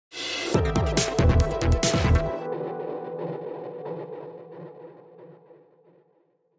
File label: 8 Bit, Sting